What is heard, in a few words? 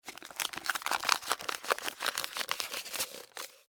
Newspaper Roll, Extermination, Newspaper, Roll, Insect, Bug; Sound Effects:Animals:Insects; Blastwave